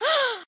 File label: gasp